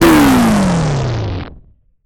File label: Title Transition Power Down; Production Element